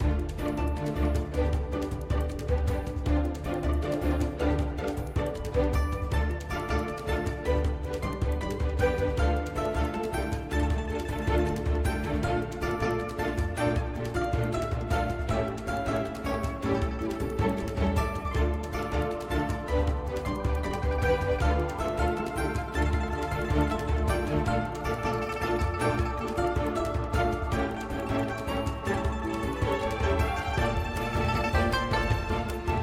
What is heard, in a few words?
mus; pirates